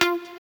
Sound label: synth shot F